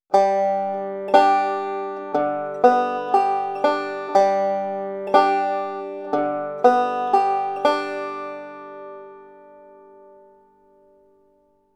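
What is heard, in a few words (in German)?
alternating thumb roll mit pinch, banjo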